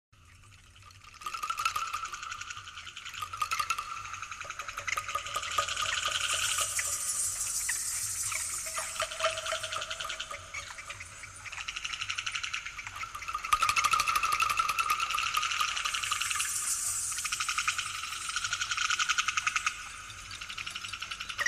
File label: Laughing Drums